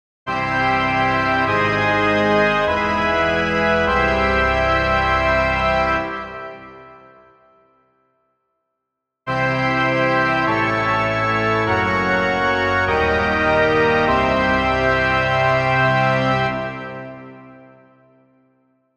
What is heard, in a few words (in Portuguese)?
encadeamento medianticas maiores